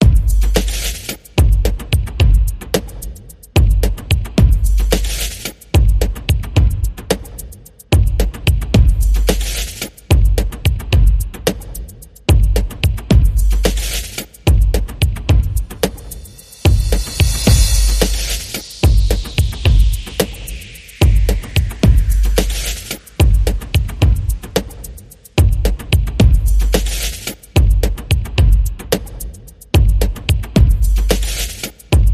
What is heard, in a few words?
Beat